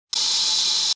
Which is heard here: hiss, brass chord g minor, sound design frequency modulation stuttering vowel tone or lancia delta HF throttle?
hiss